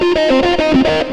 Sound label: xtra lead